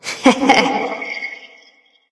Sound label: heh heh light; smite; voicepack; hel